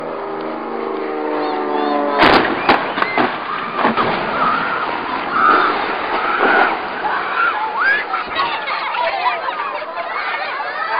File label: plane plus screams